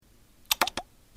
スポッ

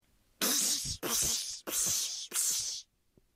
ほうきで掃く

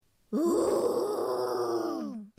ゾンビの声

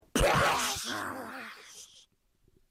水に飛び込む

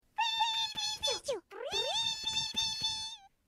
オオルリのさえずり; 山藍鶲的鳴叫; 声マネキング; 声マネキング対決; フブみこさん; ホロライブ; さくらみこ

